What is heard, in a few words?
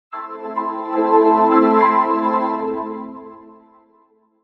in Softerezyner, Vocoder